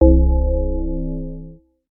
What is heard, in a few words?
SFX Upgrade - Checkpoint on